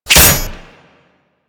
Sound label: Radial Blast Impact Metal; Warframe; Excalibur